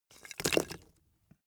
wood logs small fall

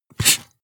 marker mark hard short

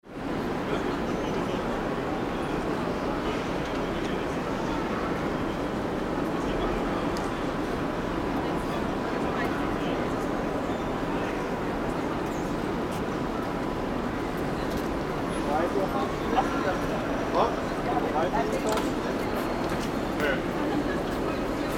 railway station hall

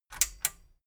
metal button stereo click enable medium

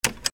mechanical switch

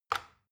plastic button tiny release heavy

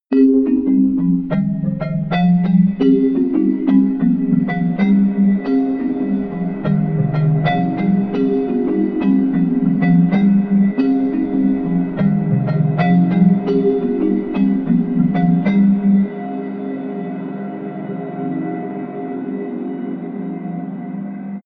sequence dark vibraphone

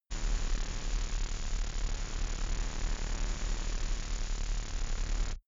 space alien engine working calm

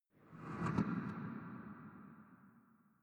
small impacts

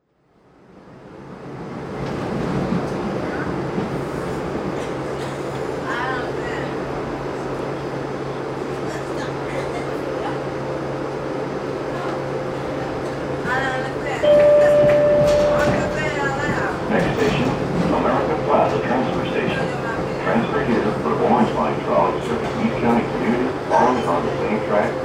trolley usa inside

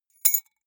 key ring small drop stone soft

